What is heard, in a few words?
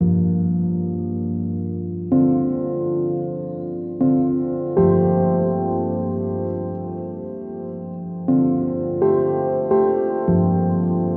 Double Cry